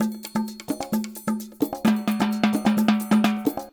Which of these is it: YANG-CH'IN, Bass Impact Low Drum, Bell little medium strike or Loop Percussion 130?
Loop Percussion 130